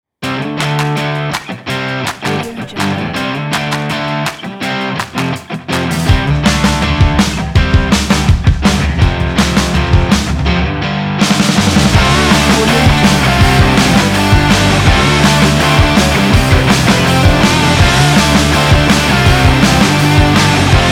dirty garage punk rock

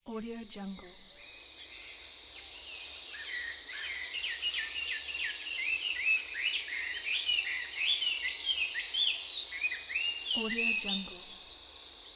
morning nature

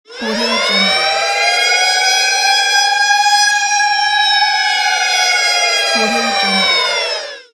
alarm sound

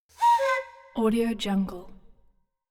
flute native wooden pipe

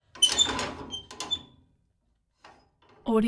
gate squeak metal

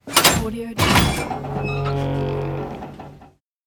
safe door open

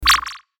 futuristic button

